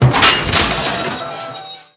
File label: metal lamppost creak; World of Tanks Blitz